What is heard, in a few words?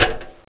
clang